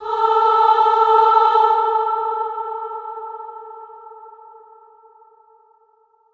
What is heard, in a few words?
aah louder; schattenlander